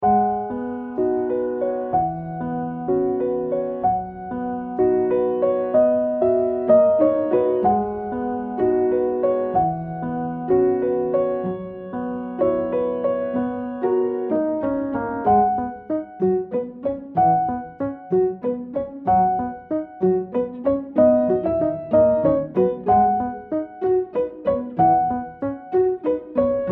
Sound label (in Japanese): Loop ～エモい切ないノスタルジックピアノ～; にゃるぱかBGM工房; bittersweet